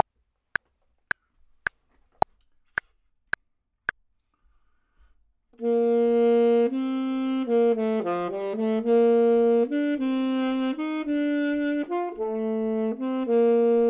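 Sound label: bottom, Saxophone and Clarinet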